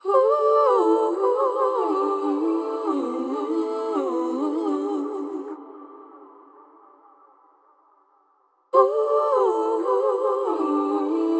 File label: Ad Lib Soul 110 BPM C Maj Wet, Vocals; Cymatics; ZODIAC Beta Pack